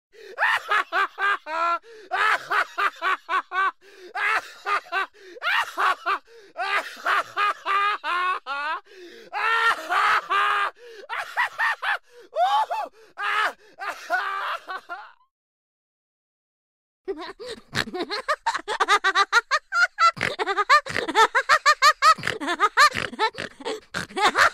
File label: funny laughing sound